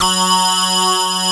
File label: JUPITER LEAD; East West - Global Sounds